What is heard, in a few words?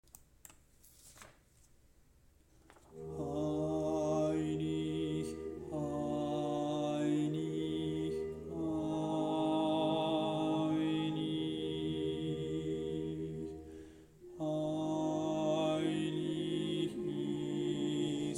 sanctus alti